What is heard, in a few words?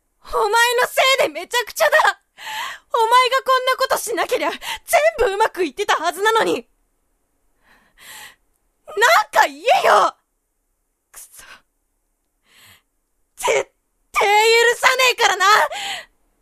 bellow; 演技; 怒鳴る